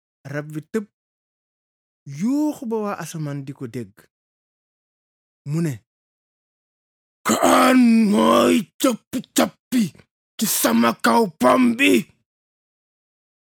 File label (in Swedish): bip; wolof